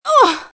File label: Large Groan; KrystalSfx